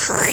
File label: Slurp